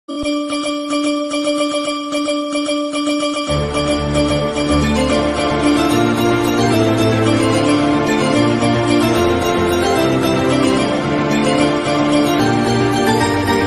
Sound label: success ringtone sad violin ringtone new motivational ringtone; motivation song